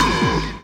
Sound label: BD Distortion, Bassdrums; Vengeance Samples